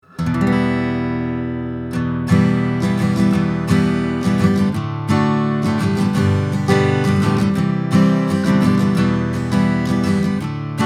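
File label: SM58 Acoustic Guitar; RME 12Mic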